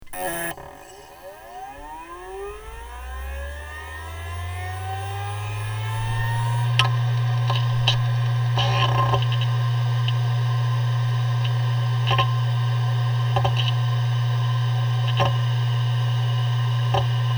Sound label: Contact Mic HDD